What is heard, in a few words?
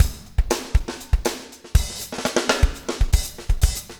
breakout 120, 120bpm loops